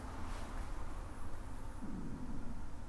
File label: ricks stomach